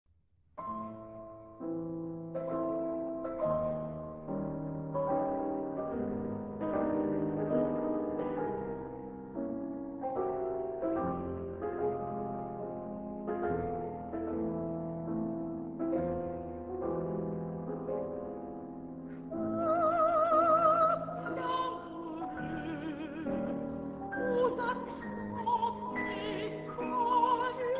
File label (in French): Violon